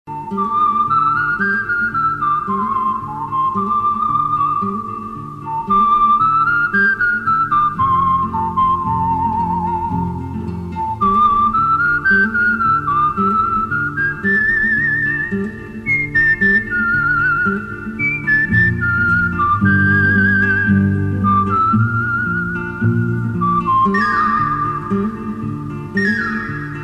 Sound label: lovely romantic whistle tone ringtone